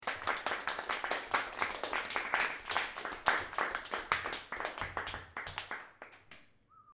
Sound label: applause 15 people indoors, Applause, Small Group, Indoors; 15 People Applaud In Small Concrete Room; Human